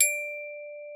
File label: D5, vibes; Xsample VOL-4